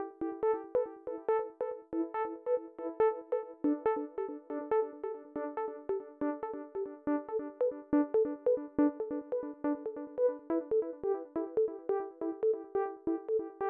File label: Soft Pluck 140BPM, Eminor; Melody & Synth; Vengeance Samples